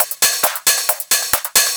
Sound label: Hi-Hat, EDM; Sonic Foundry (Sony Creative Software); Afterhours EDM; Session 10 - Come In Hard